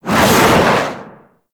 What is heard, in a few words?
Air Bombard Projectile Whoosh; Warframe; Venus